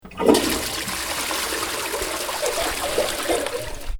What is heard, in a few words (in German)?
Klospülung